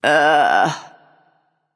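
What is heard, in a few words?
ugh, female; smite; voicepack; hera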